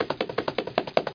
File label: SHAKE, dogz